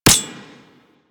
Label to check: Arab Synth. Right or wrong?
Wrong